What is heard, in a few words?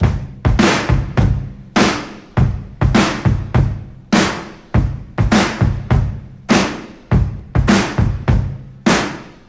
102 bpm breakbeat sample g sharp key, Free breakbeat sample - kick tuned to the G# note